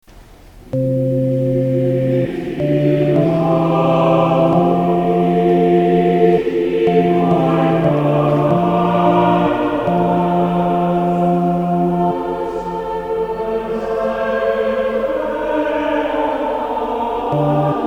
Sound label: Alto accomp